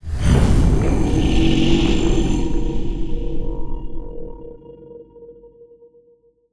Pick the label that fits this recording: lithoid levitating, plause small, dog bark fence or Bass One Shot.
lithoid levitating